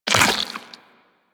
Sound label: Step Goo, Footsteps Walk; DE; Warframe; Tenno